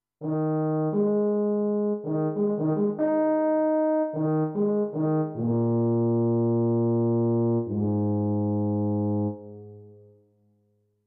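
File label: French Horn, digital piano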